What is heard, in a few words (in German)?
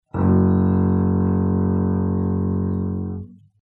leeres fis gestrichen